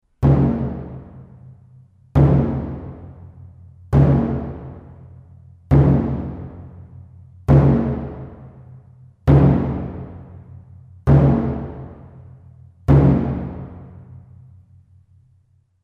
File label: drum taiko odaiko sequence